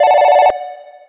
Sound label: Incoming Cellphone Call; American Fugitive